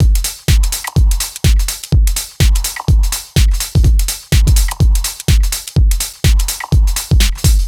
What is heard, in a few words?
Full Loop 125, Loungin House; Equipped Music